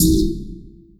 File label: Oneshot